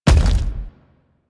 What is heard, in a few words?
Obelisk Stone Connect; Warframe; Infested Eris